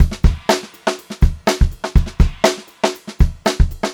Funk-Groove Drumloops, 122 FUNKY, R; USB Soundscan vol.38; AKAI; 90 s Sample CDs

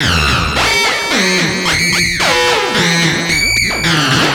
Atonaloop, Industrial Analog; Analoops; Sonic Foundry (Sony Creative Software)

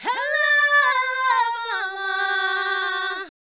Fem Vocal 128bpm G FX, African Voices

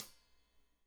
Hat Tight, Hi-Hat; Deeply Sampled Drums; Cymbals Stereo

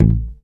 YAMAHA5 MUTE A1, Bass

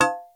INDO DAIKO, FM PERCS; Crypton - DrumBase5000

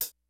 JAZZ KIT; Kid Nepro; Elite

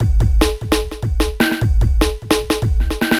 Electronic, Drums & Percussion

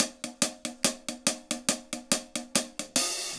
HAT, HI HAT LOOPS, Dub; Drumdrops In Dub VOL-1

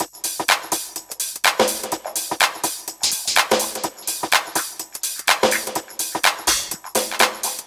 LOOPS 125, House; Best Service ProSamples vol.10; AKAI

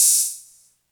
Cymbals, Elektron MachineDrum; SPS1 MKII

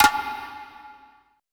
E CGFLAME, ETH DRUMS

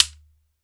SHEKERE, African Percussion; E-MU Producer Series Vol. 7 – Old World Instruments

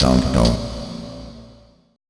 FX VOCAL

